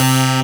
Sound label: Synths Root C